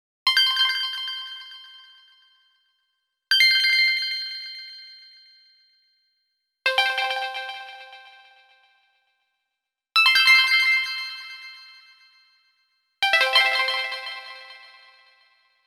Tong hop am thanh tra loi dung hoan thanh nhiem vu

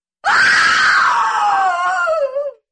Nhac chuong tieng gao thet kinh di

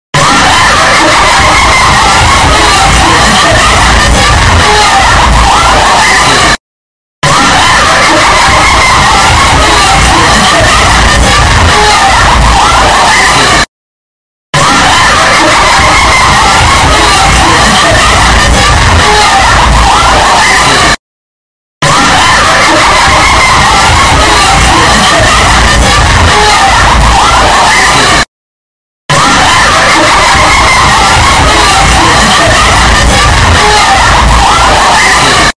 nhac chuong bao thuc hieu qua nhat qua dat tiktok

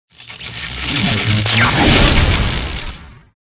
Am thanh tan cong ma thuat bang dong dien

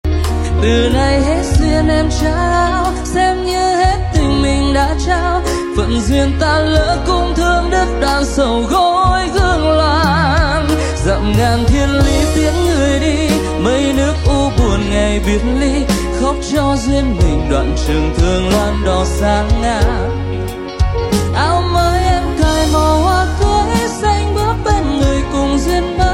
nhac chuong giong nam

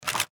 Tiếng màn trập máy ảnh kỹ thuật

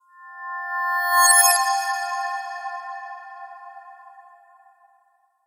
tiếng chuyển cảnh video nhẹ nhàng

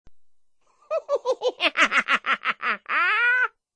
Tieng cuoi nham hiem phim hoat hinh

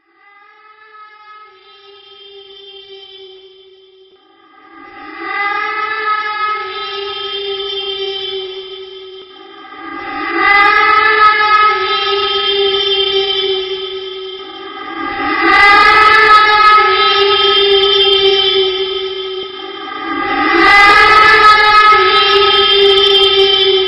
Nhac chuong tieng ma doa rung ron

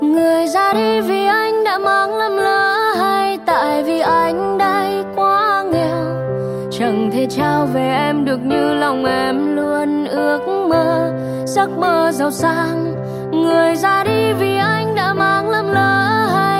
nhac chuong giong nu cover

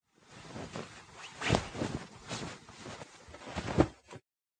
Am thanh quan ao cha xat gap co ao quan

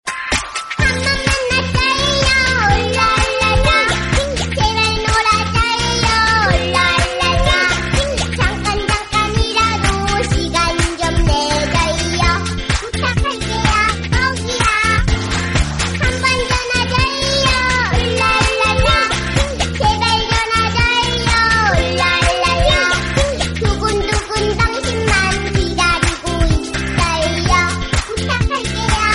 Nhac chuong tieng tre con hat de thuong

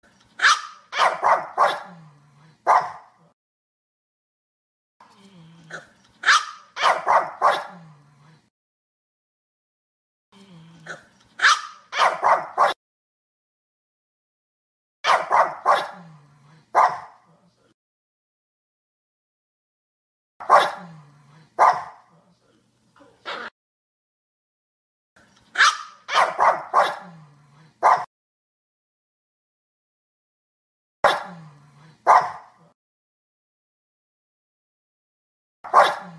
tieng cho phoc sua hay nhat

